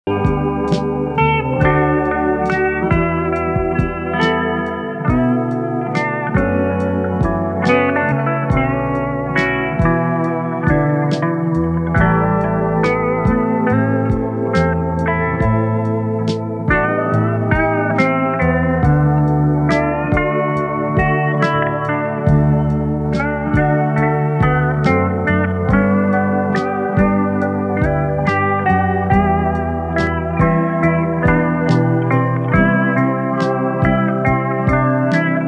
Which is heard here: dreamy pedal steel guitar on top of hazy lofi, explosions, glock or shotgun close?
dreamy pedal steel guitar on top of hazy lofi